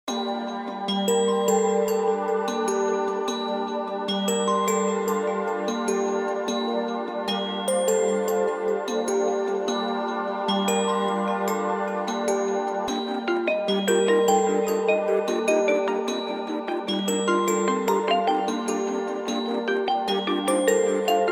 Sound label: Chimes